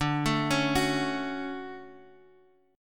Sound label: D-Major 7th D down